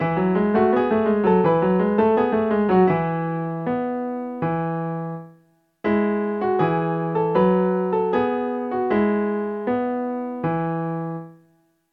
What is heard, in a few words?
dotted 8th note in E